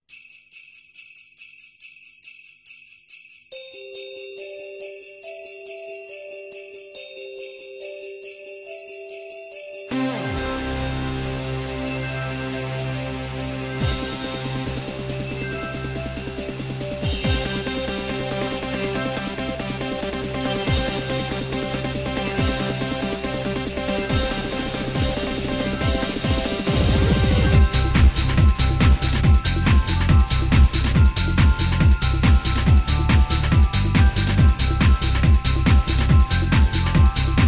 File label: Techno Christmas Jingle Bells Club Remix